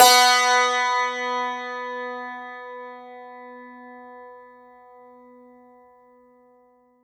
STR, SANTOOR

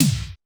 Mega Drums, Korg 01W

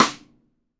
METAL, RIM SHOT

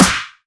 snare amped; M8

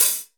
FUSION KIT; Northstar - New Gold VOL-1

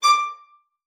VIOLIN SPICC, D6; Xsample VOL-5

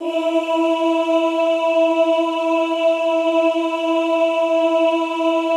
OOH E3, Classical Choir; Best Service; Extended Classical Choir; OOH MIX

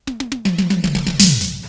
JAM FILL, DRUM FILL; Crypton - DrumBase5000

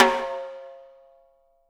6X12 WOOD, drums; Living Drums

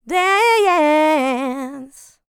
licks, vocal, dance; ILIO; Vocal Planet

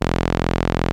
ARP 2600 F2, synthesizer; Hollywood Edge - Giorgio Moroder Rare Synthesizer Collection

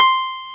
PIANO C6; Big Fish Audio; Synth City

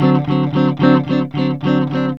rock D 110, Vintage Blues Guitar; USB Soundscan vol.22; AKAI

